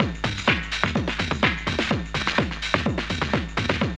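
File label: drum loop; X-Static Goldmine 3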